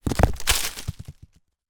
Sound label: rock small down on others leaves roll ground; foley